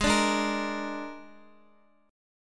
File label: Ab Suspended 2nd Flat 5th Ab, Guitar; strummed; guitar chord; x,x,8,7,8,6; JGuitar